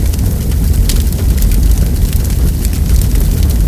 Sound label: fire small; Quake 4 Alpha; id software